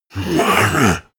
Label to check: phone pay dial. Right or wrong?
Wrong